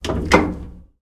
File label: turret klank; tanks; War Thunder